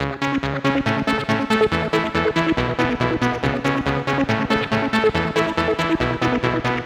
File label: 140BPM C#; Vengeance - Essential Clubsounds Vol.4